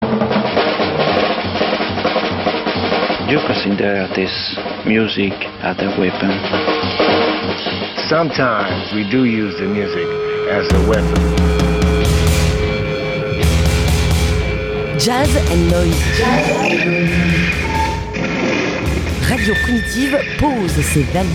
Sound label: jazz noise; sunnyside 2024